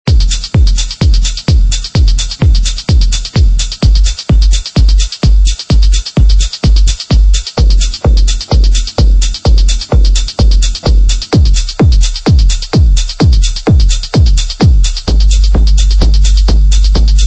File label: Techno Kickdrums; Riemann Techno Kickdrums 5